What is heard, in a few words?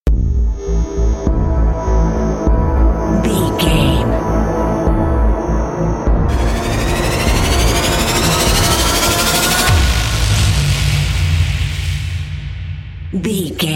pulse fear bumper